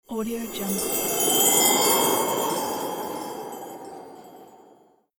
christmas whoosh

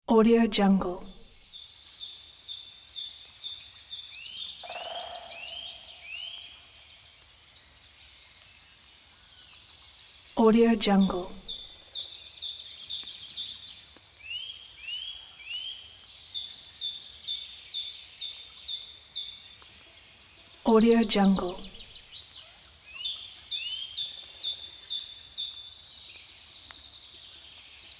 birds in forest

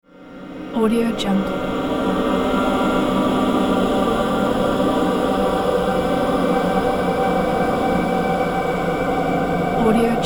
ghostly choir; marbury-media

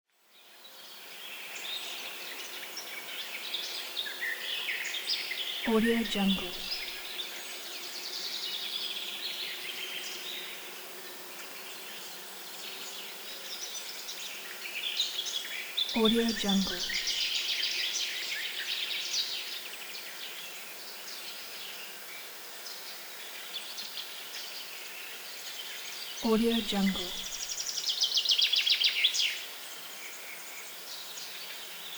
birds; volkovsound

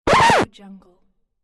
vinyl scratch

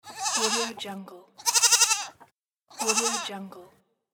goat; julesounds

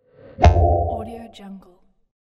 cartoon hit

